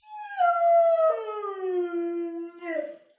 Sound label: Large Dog Whimpering Whining Crying